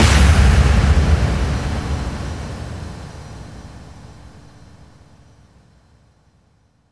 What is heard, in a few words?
Bassdrums FX, FX Kicks; Vengeance Samples